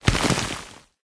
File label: dirt; smite; god scylla